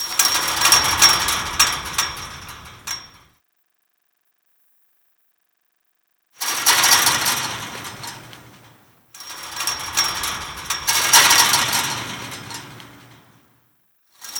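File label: metal chainlink rattle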